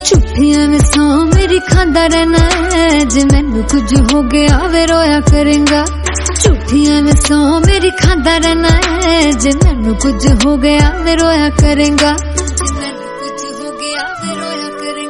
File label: Punjabi Song